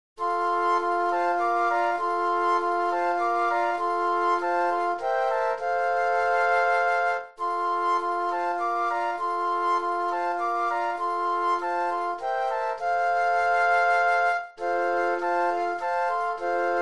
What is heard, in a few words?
Flute Quartet; christmas